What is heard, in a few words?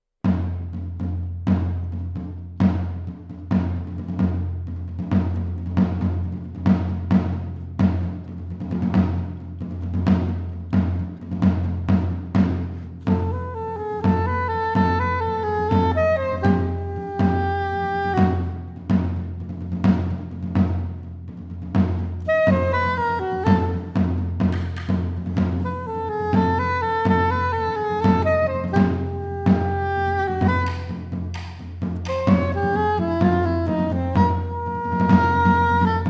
suite for saxophone and percussion vivace